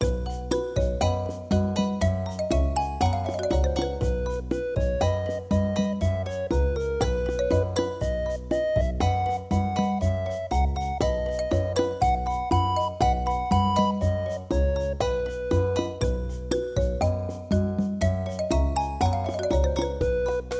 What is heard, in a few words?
Melody